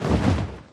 wings; barioth; mh event

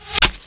quill target; weapons; dota; bristlebog

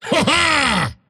axe laugh; dota

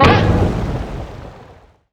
cookie impact; weapons; dota; snapfire

